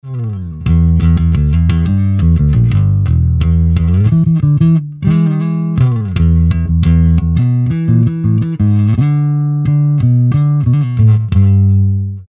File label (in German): Badewanne Bass MD421